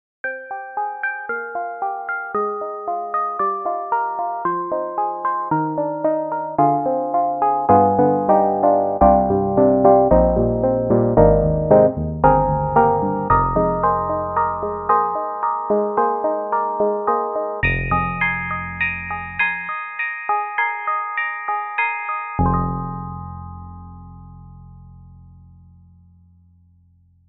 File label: FM Dark Grand; Steinberg HALion 7